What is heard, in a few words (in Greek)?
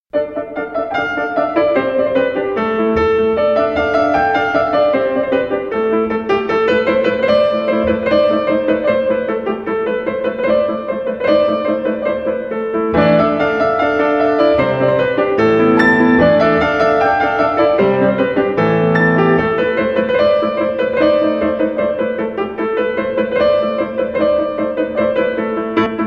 Συρτός